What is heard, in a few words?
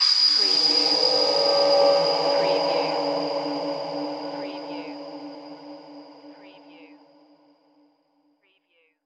Hit Vox